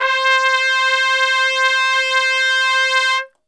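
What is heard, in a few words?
C 3 trumpet straight, brass; Best Service; Brass Super Section; TRP STRGHT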